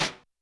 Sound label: Brush Tap; YAMAHA RM1X